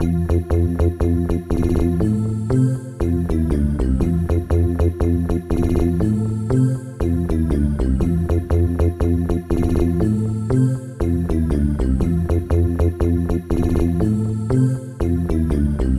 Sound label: Cartoon Bassline